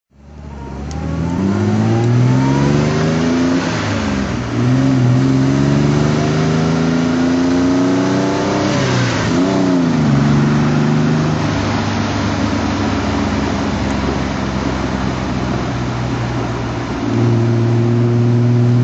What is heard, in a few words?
austin healey 3000 mk2a drive